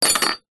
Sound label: industrial metal hacksaw junior down on surface